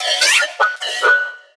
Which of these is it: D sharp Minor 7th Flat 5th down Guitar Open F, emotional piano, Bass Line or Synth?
Synth